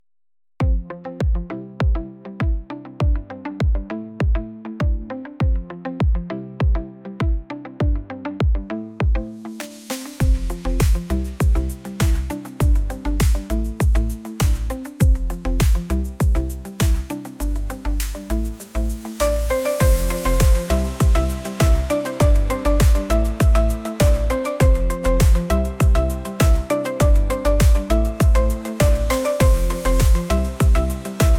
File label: Electro-Pop